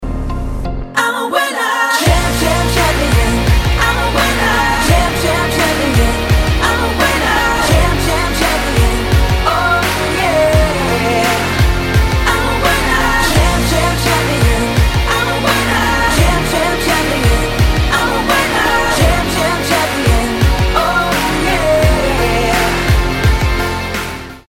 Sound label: Singing, female singers